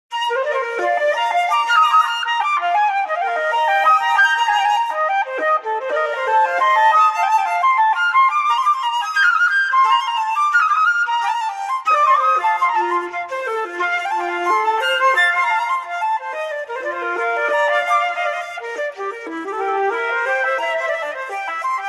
2 part Invention on 2 flutes; Andy Findon; Bach and Telemann; Johann Sebastian Bach

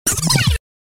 Reverse Mouse Tracks; DJ sounds

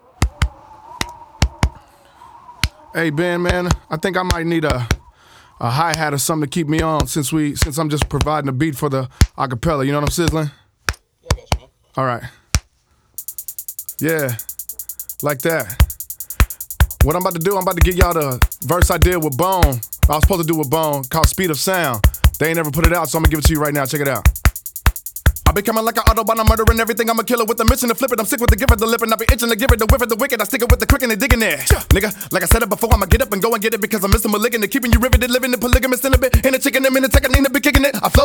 (Acapella)

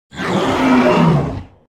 Animal stinger - Double pitched gorilla yell; Hollywood Edge Sound Designers Tool Kit

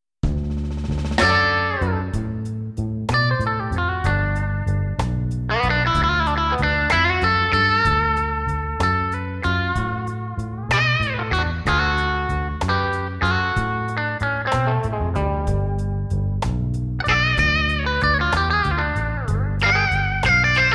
SD-90 Blues Guitar; SD-90 MIDI

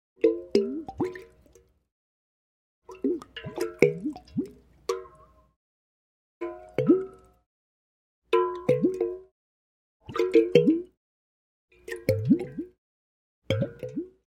Multiple Water Cooler Glubs, Household

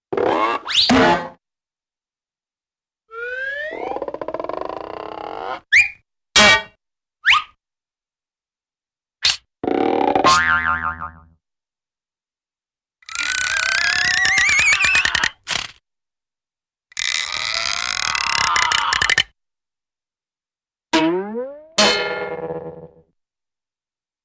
Stretch & Whiz Bonks; Hollywood Edge Cartoon Trax

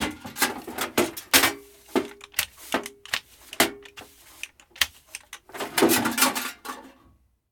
DUSTPAN, DUSTING; 1000fx

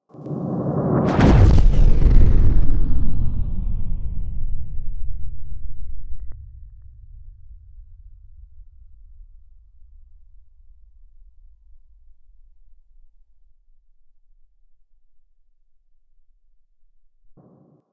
whoosh; Whooshes and sweeps

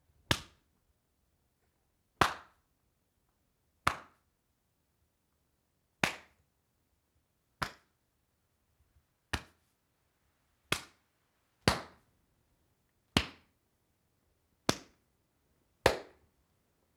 Back Slaps Impacts Slappy Various Versions; Foley; Foley Essentials; Foley - Misc

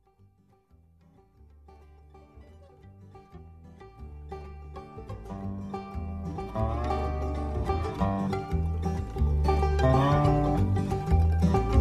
Dobro

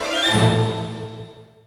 ACCENT, MUSICAL; 1000fx

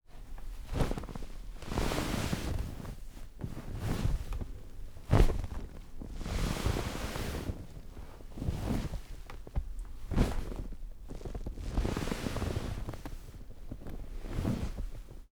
Vinyl Seat Sitting Down Moving Around; Foley; Props; Foley Essentials

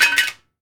IRONWARE, IRON WARE HIT; 1000fx

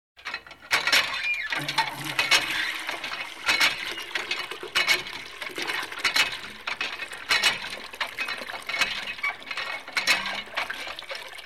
Squeaky water pump; SOUND EFFECTS1

